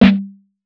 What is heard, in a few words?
Snares, Alesis Performance Pad